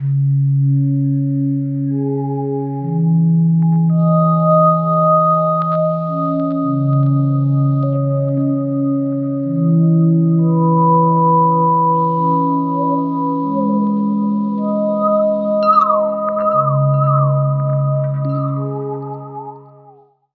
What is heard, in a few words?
Foggy Morning CMin, Atmospheres; Zero-G Ambiosis